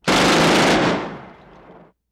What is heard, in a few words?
machine gun burst